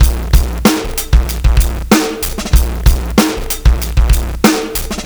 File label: R&B Drums